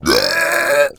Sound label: AMB China 3D Amb; Rabbids Multiverse